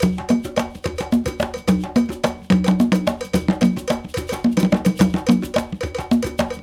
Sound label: Bembe 6/8, World Dance